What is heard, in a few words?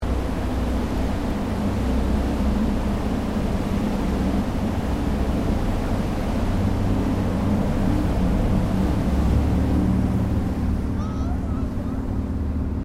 Boat Water Taxi Large Williamstown Very Slow Under Bridge, Large river water taxi